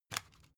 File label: cd take out soft